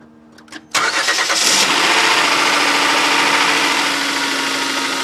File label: 3.5l mpi engine start-up noise; after repair